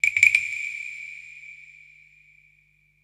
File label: SIERRA PER; Best Service Advanced Media Trax Building Blocks